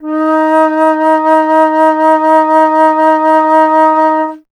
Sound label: Alto Flute, Solo Instruments; Miroslav Vitous - Solo Instruments VOL-2